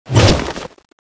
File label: throw, weapons; bloodhunter